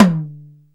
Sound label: DRY TOM DP; Back In Time Records; Big Bang